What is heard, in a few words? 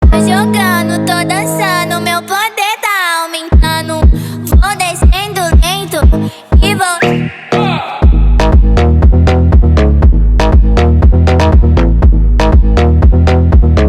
brazilian phonk